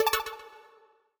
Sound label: decision, button